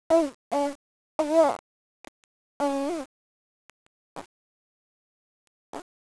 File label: coo